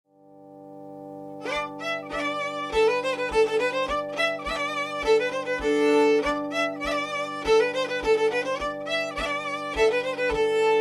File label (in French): Polka piquee